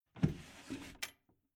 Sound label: Antique Old Furniture Old Wooden Draw Open Metal Handle Rattling; Drawer